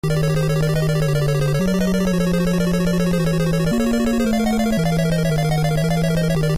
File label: Castle (Fast); Mari0